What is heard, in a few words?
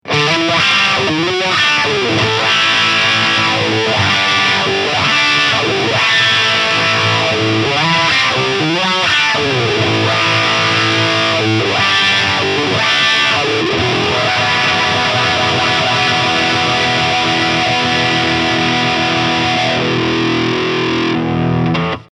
Morley MTLW2 Lead Wah Boost Lead